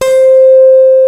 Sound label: ENSONIQ VFX ROMANCE; Rubber Chicken Software Sample Bank